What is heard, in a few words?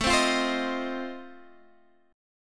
F 7th A